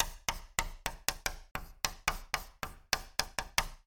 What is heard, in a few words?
blackboard choke